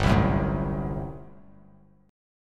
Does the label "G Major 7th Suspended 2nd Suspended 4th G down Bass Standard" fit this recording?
Yes